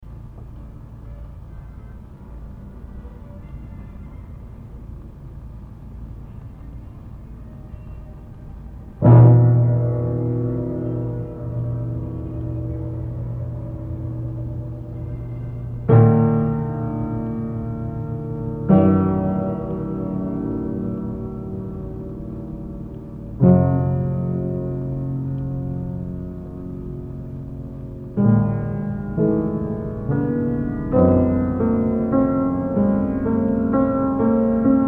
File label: Piano